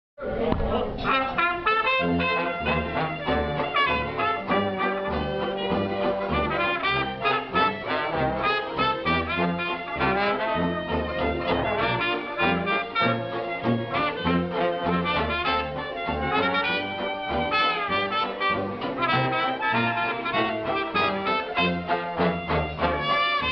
Joyful Noise Jazz Band

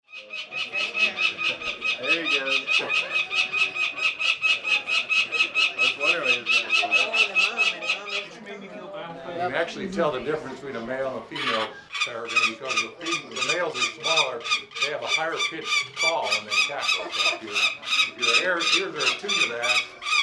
falcon screaching

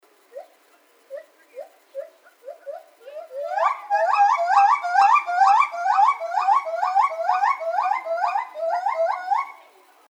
duet

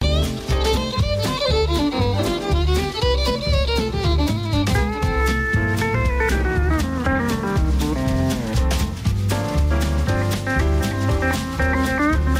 jazz

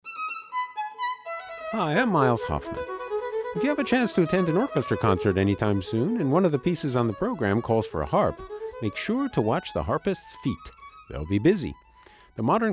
harp

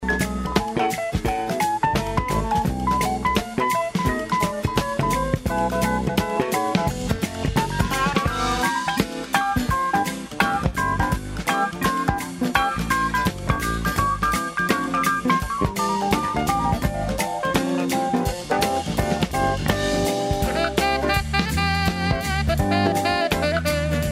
jazz

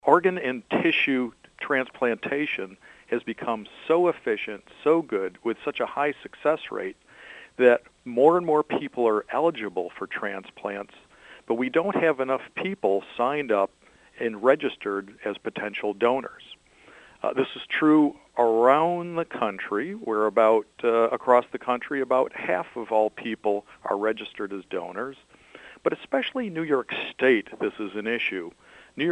Organ

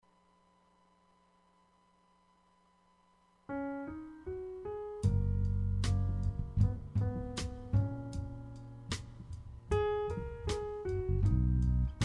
jazz

